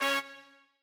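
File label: trumpet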